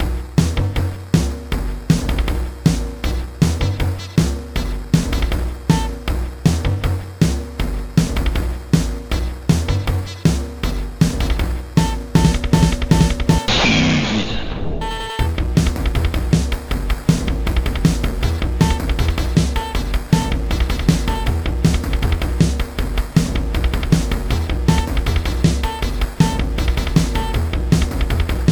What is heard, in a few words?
clowncore cave